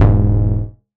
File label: Bass E, techno synth shot; Bass One-Shots; Ghosthack; Techno Synth Shots 2025